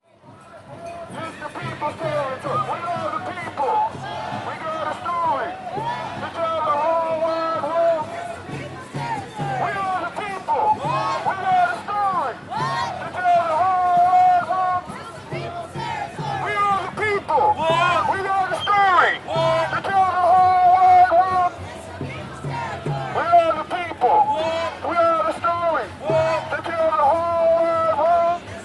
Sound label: protests peoples territory; ravenswood